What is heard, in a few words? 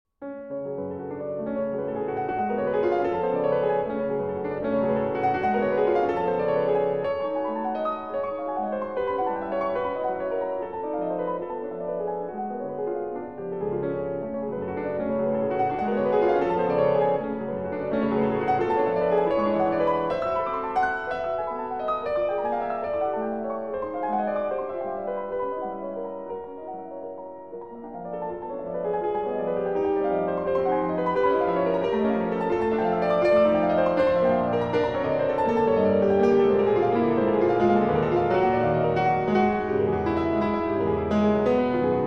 Preludes, Molto agitato in F-Sharp Minor